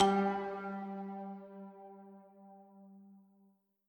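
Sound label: Music Maker Instrument 3 G; barbie dreamhouse adventures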